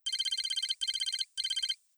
twitter numbers long

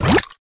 Meat Plop; A Robot Named Fight

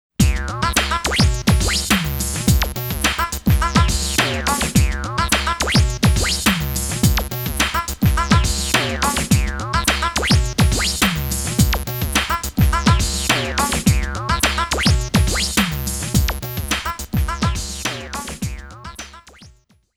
COUNT 20 (Continue)

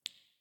Cave Random Drips; The Inn

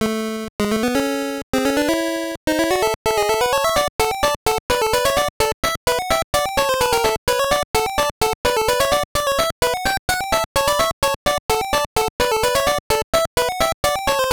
BGM; Taiyou no Tenshi Marlowe Ohanabatake wa Dai Panic; GB

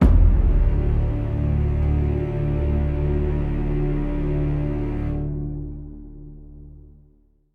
sting Tense; the walking dead michonne 3 what we deserve

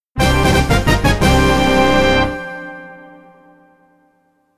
ROUND CLEAR (MT-32 Arenji Baajon); Namco Sound Museum from X68000

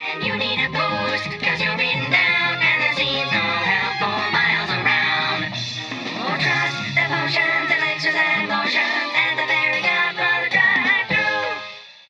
Drivethru Jingle; Shrek 2; GBA; GC; PS2; Xbox

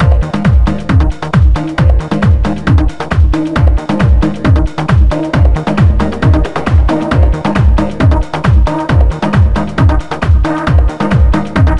Evening Electro; Cosmopolitan Total Relooking; NDS

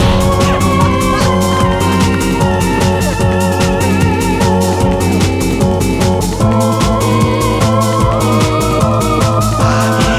Music Winning; Musicastroland2

